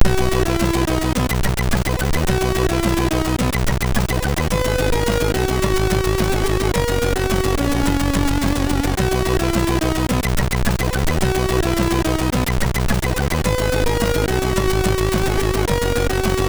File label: Jumping Jack, MOS6581R2; Andy